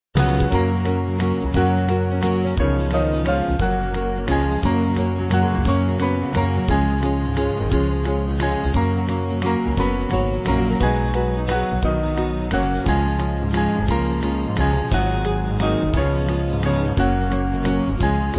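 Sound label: band, Music Backing Files for Churches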